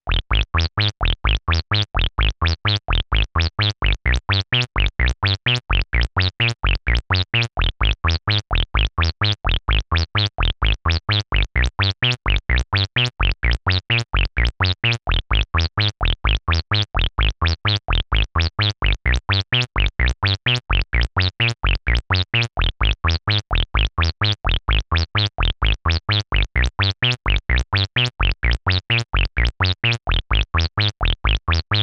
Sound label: Bub Saw, SH-101; sh101 sample pack